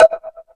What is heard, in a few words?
House Machine, Drum Machines